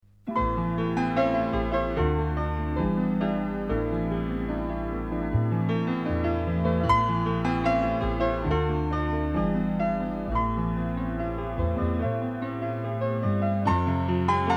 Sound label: sunlight (piano), jingles; atmosphere music library; short cuts, jingles 3